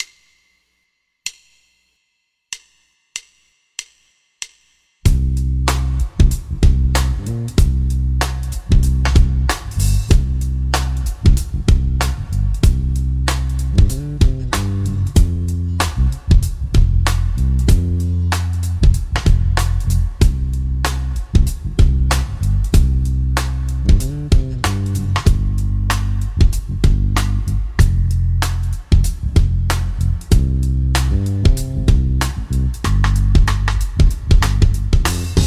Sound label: BLUES FUNKY EN C